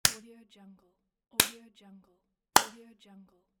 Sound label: slap in the face